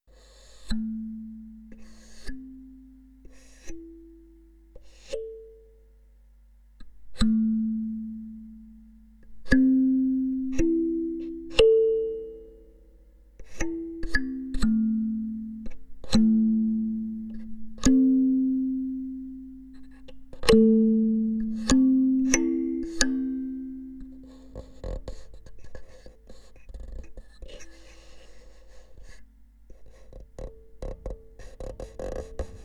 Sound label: kalimba